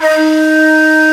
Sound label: Nye Flute D#3; Multisamples; Digital Sound Factory Planet Earth